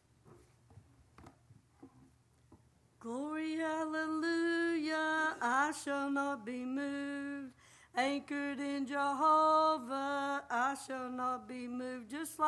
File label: singing